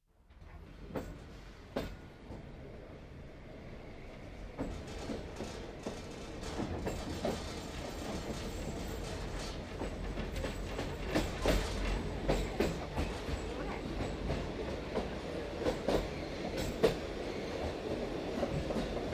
Pioneer railway, internal, (narrow-gauge railway across Buda Hills run by school children), starts, constant run with hooter and muted chat, stops; Train; BBC